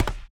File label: step stone, footsteps